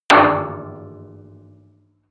impact sheet metal thick; maschinen; soundpool

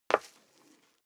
step high heels wood parquet slide long; schritte

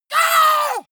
monster growl attack high; schreie

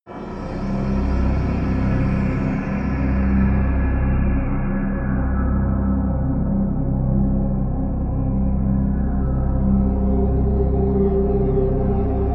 drone complex drone

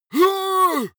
monster growl attack; schreie

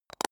makeup box close short; haus-garten; badezimmer

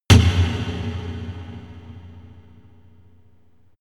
clattering decay; hits explosionen

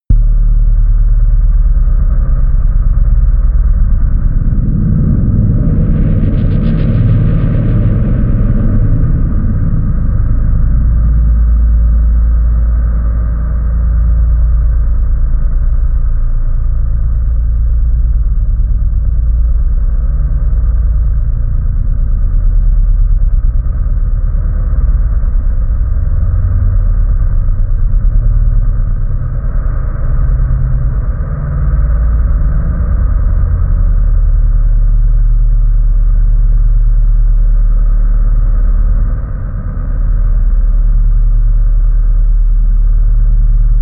drone rumble flutter

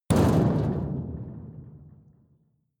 metal impact sheet rumble rattle medium

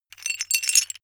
metal pieces clatter short

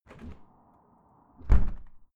window open close soft; objekte; fenster

